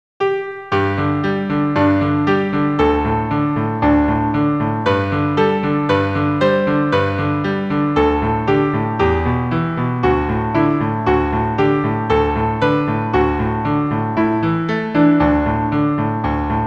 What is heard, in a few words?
single note r.h; Adeste Fideles